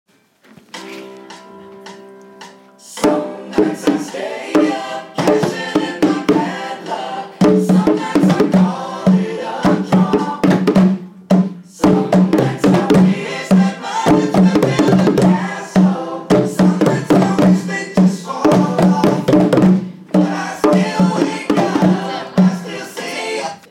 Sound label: rhythm composition